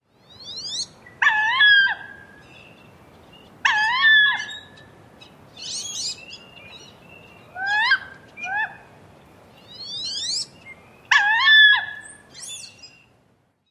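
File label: male squeals and female call variant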